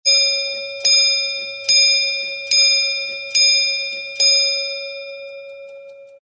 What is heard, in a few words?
wall clock chime